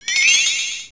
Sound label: Grab Cash